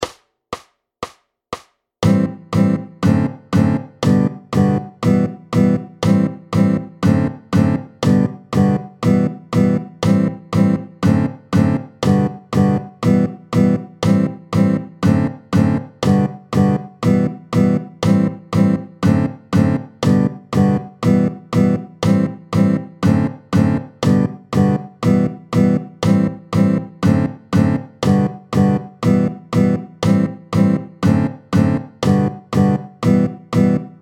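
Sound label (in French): Vite tempo 120, Anatole, Vite, tempo 120, guitare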